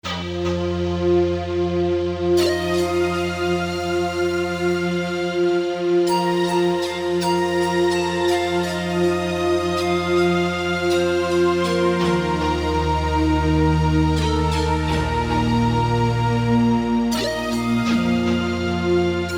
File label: Generalmusic Equinox; OverYou